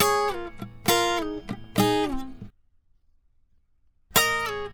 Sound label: 101 Pop Ac-Guitar Dbmaj; Pop Rock; Acoustic Guitar; Pro Tools Essential Loops; Digidesign